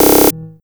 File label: Tom Roll Off; Wormmonolith